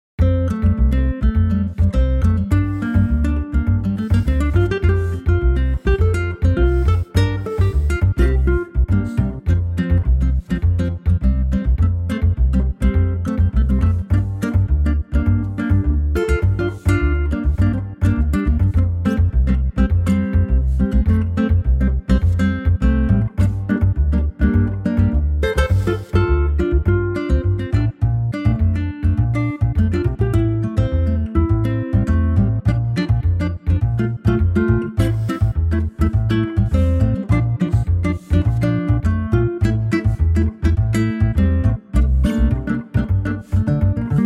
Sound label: latin F no solo; key - F - vocal range - A to A; Quirky and cool acoustic guitar, bass and accordion arrangement, with a bright latin feel